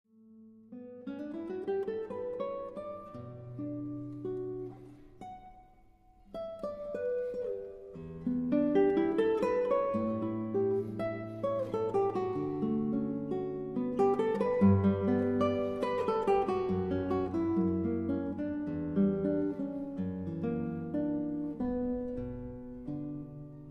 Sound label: jazz, guitar